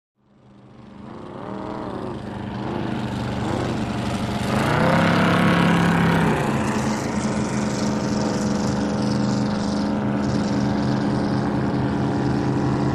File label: Drag Boats By Slow, drag boat